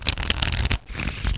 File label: shuffle; Children's Atlas of the United States